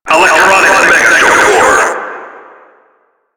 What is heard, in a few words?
Tentacle Swing; Warframe; Proto Infested Ancient; Abilities